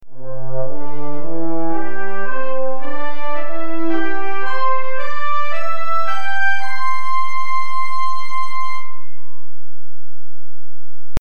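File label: Loop Orchestra Brass Intro C 110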